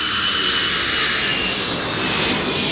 Jet; Working Model Program

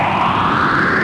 Swish; Working Model Program

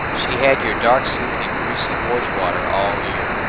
timit noise; DIGITAL SIGNAL PROCESSORS

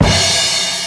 Cymbal; Working Model Program

